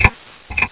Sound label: Metronome Click